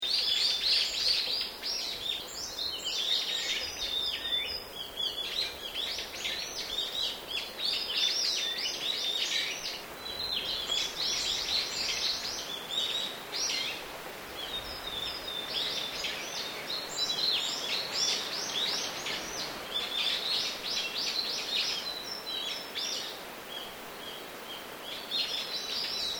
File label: Ambience Sannohe Forest Spring Birds, Ambiance recorded in Sannohe Japan; Loop; Forests and Jungles